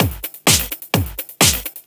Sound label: Straight Loop 128 BPM, House